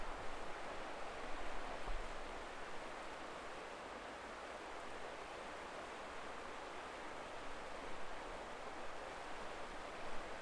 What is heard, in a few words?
Footpath at River; Bridge of Earn